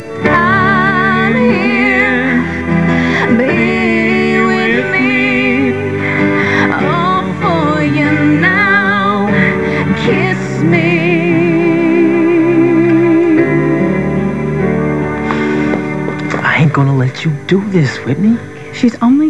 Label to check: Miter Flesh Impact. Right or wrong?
Wrong